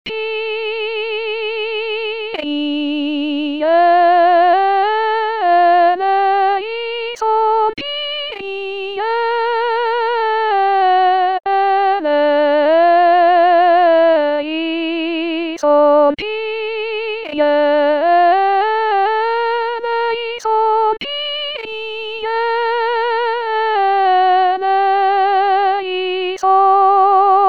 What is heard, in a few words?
Kyrie Soprano; Sopranes seules; La Messe O magnum Mysteriumde Tomas Luis de Victoria